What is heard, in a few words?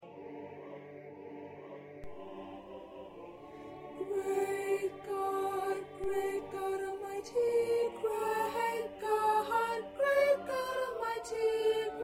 2nd Soprano